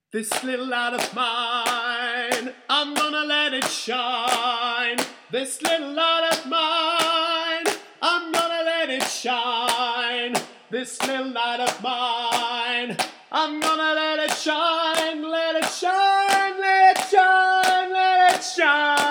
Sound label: This Little Light Of Mine Alto